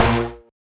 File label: marimba steeldrum; ゆめ2っき